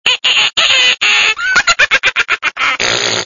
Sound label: razz